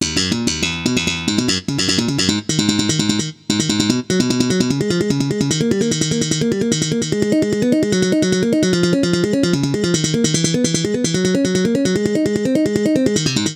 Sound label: bass